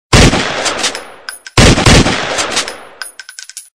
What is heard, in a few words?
Shotgun